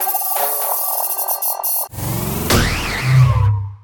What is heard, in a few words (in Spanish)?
mira + laser